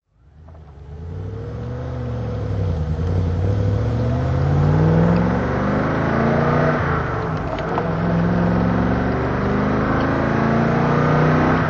triumph stag drive

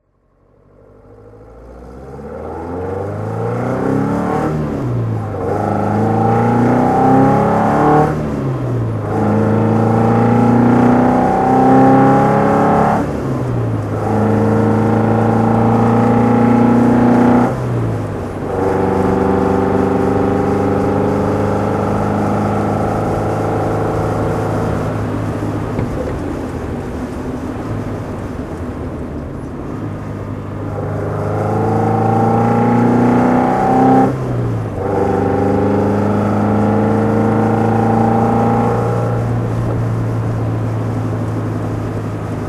alfa romeo GT 1600 rally drive

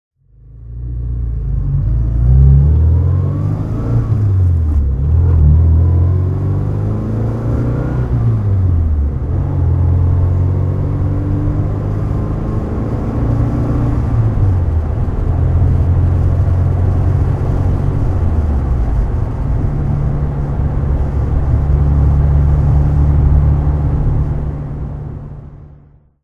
alfa romeo spider t4 20 driving

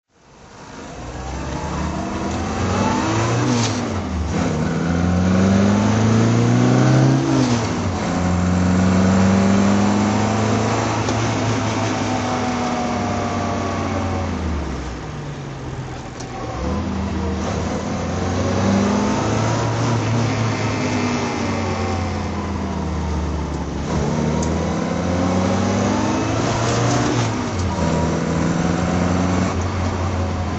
austin cooper s drive

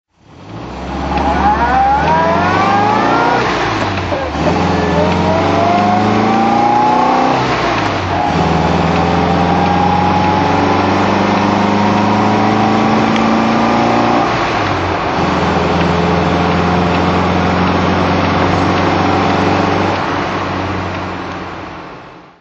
MG MGA 1600 driving

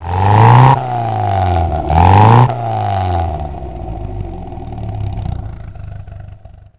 mga mk2

